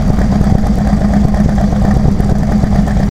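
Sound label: exige idle,car engine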